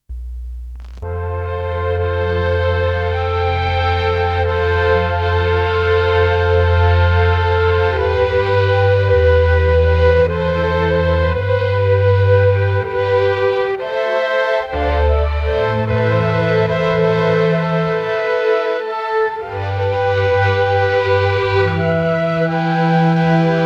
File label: classic vintage orc